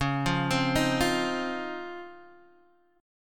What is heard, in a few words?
Db Major 9th, Guitar, Open D